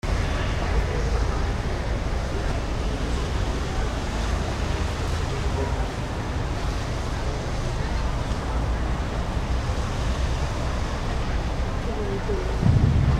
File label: atmo germany berlin museum exterior; altes museum